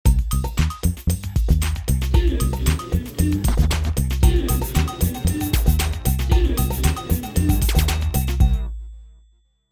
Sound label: ringtone